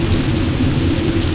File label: ROLL RAIN; V-Rally